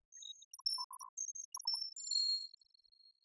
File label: Glockenspiel